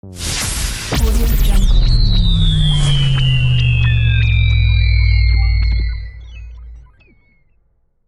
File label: radio transition imaging fx